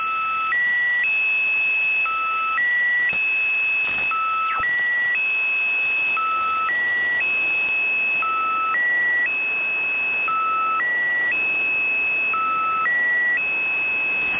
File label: wheel marker